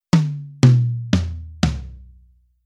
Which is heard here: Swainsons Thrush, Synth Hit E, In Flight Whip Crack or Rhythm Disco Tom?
Rhythm Disco Tom